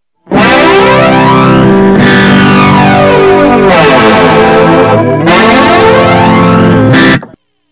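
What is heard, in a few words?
od + flange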